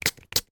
foley sun protection spray dry oil empty 2x sprays; sound effects one